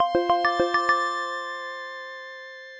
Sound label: Notification Sound